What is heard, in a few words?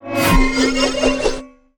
Sword Throw Swoosh; Tyrael